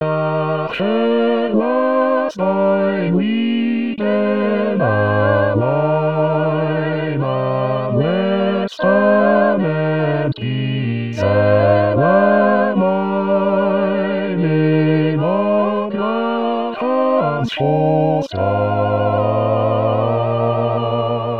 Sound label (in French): Bas; Bach BWV 245; BWV 245 68